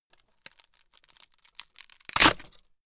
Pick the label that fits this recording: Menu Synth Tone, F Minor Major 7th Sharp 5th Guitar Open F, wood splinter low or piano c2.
wood splinter low